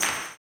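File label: tambourine, 160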